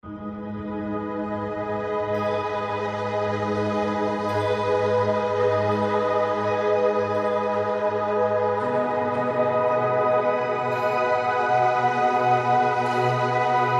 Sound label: A Meditation